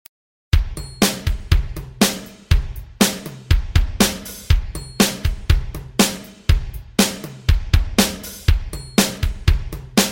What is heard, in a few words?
drum beats